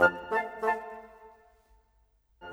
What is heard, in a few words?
Bassoon Flute, Orchestral; Sonic Foundry (Sony Creative Software); Orchestral VOL-2; Modern 29